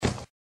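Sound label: cardboard Floor; SFX Kartong Floor; Granny 3; Android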